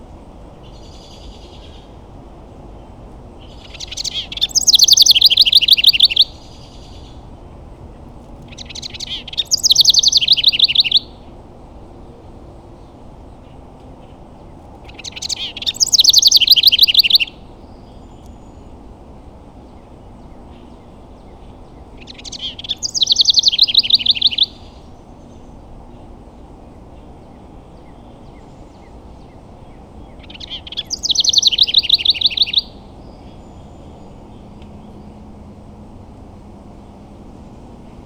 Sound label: house wren singing